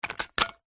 papadums x2 set down on empty plate

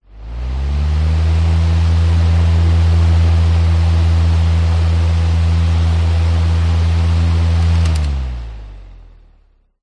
household fan desktop run short off close up; zapsplat

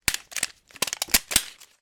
industrial tool tape measure tape metal bend move

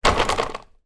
vehicle bicycle drop down land with baby seat

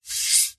sport snooker cue slide hand; zapsplat

